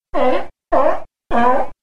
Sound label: seal barking; wattenmeeres; die lichtbringer des wattenmeeres